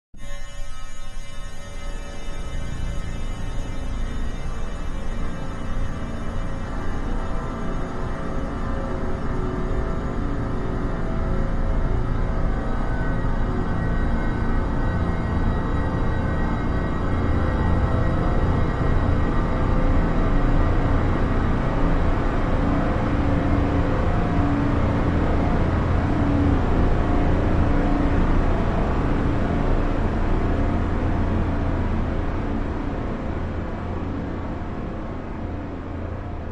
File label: horror dark menacing scary droning atmosphere